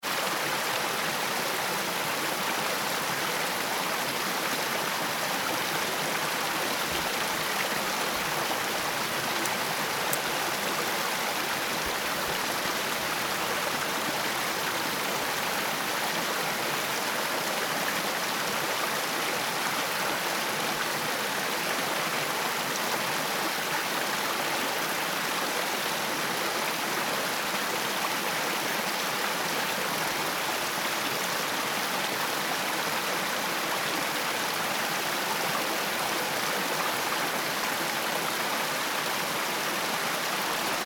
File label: river